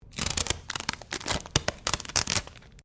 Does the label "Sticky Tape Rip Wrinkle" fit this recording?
Yes